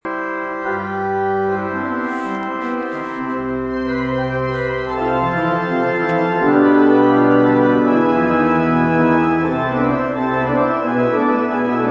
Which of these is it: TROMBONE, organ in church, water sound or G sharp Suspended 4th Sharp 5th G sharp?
organ in church